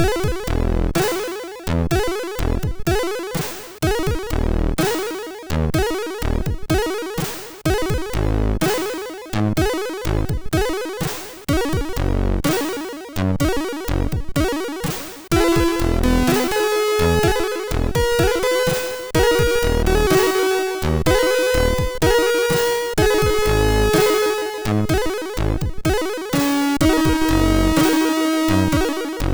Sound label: Classical, sid MOS6581R3